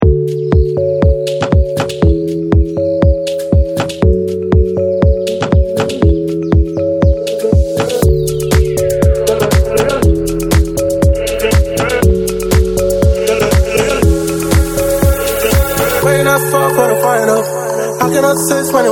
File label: 11A 120 Afro House